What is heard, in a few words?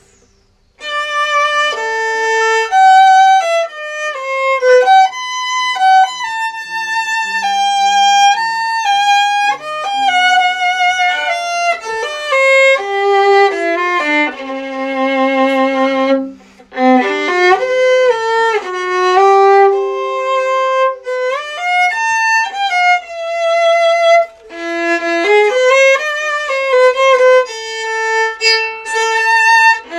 5-4 Violin